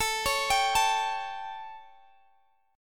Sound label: A 7th A, Guitar Half Step Down